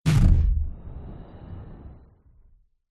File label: sound design impact designed glitchy thud; zapsplat